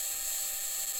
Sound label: mic, mars2020 edlcam